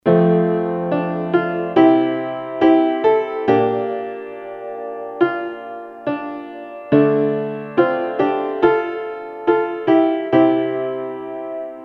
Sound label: piano; takedano komoriuta